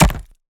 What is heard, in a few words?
creature wood texture crack short; Bluezone Corporation